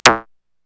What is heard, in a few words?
ZAP2, Korg Electribe EMX-1